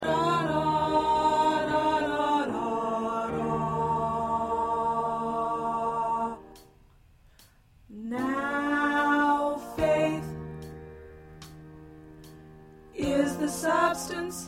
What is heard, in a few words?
Alto, choir